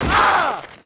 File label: FALL, Rider